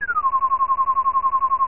Moon; NinjaBoy

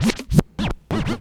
scratch, rat; Sonic Foundry; Phat Trax

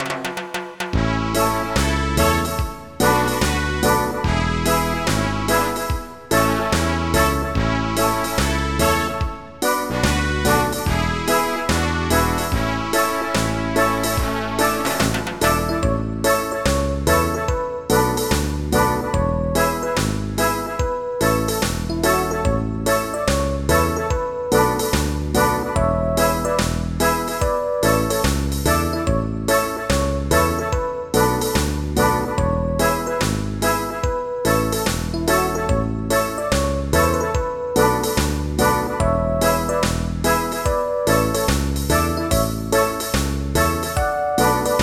REGGAE

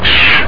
cricket sound; DR BRAIN; BUGS

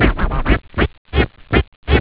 scratch, rat; OctaMED SoundStudio V1

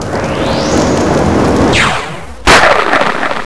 sentzap; XMEN2

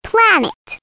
plane

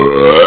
Burp; SimPiglet

